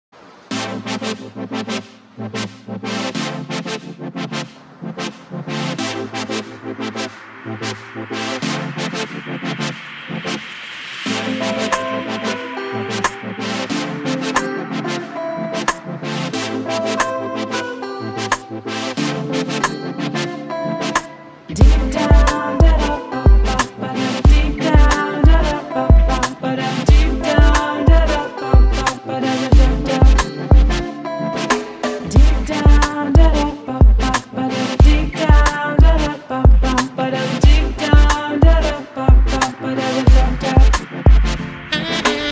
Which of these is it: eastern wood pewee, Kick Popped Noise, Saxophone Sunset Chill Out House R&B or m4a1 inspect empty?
Saxophone Sunset Chill Out House R&B